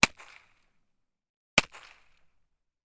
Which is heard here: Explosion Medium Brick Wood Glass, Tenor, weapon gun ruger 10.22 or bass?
weapon gun ruger 10.22